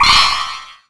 Popup Fail; Finding Nemo; XBOX

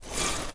metal slide sand; objects; Star Wars Obi-Wan; XBOX

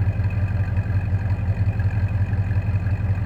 car idle; Matt Hoffman's Pro BMX 2; XBOX

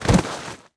bodyfall grass, foley

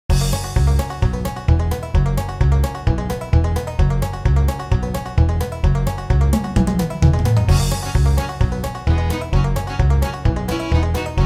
Pinball, Music; Pure Pinball

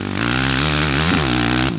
Mid, Engine

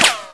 bullet ricochet cement

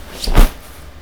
clothes; people; Pirates Of The Caribbean

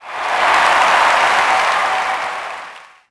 POS Reaction,Crowd; Cars; XBOX

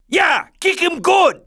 CHEER; TrueCrimeStreetsOfLA; XBOX

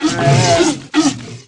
Gado Pain, Aliens

